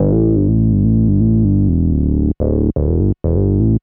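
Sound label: synth bass, house, 125, A; eJay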